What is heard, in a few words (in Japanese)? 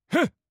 攻撃